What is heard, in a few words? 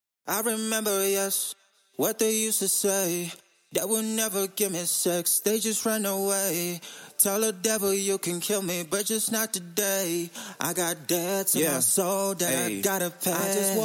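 hip hop sing, 140, C major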